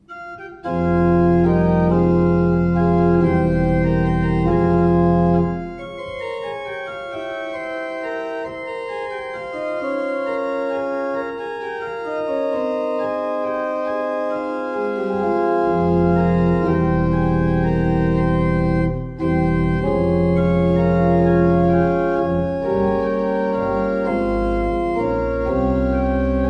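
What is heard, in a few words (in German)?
Bosch Raupach 5 I P, pfeifenorgeln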